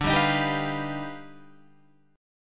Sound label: Eb Augmented Major 9th D